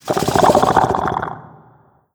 idle croak, barnacle; Half Life Alyx

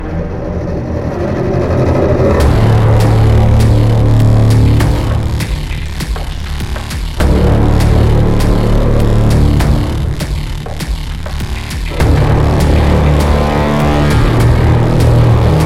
tanker arena combat, music; c17

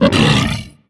grunt unhappy, barnacle creatures; Half Life Alyx

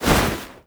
body oneshot, foley

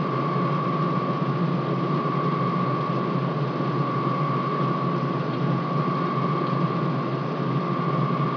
fan loop, ambient tones

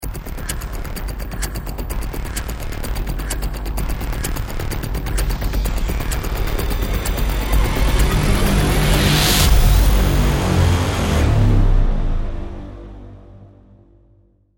bomb ten sec count

